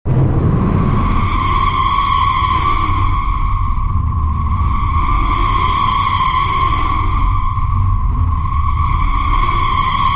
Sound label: Horror Atmosphere